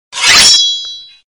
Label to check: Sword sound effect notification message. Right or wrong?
Right